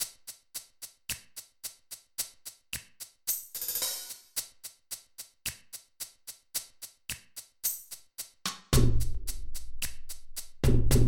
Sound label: rhythm; FF7DEMO